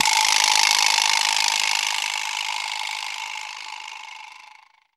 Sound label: Vibraslap, Percussion Kit; Akai MPC2500